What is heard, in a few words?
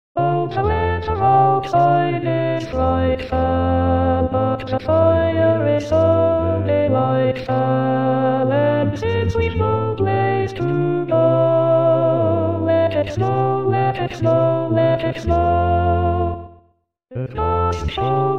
Alto